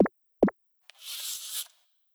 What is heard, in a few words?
twitter post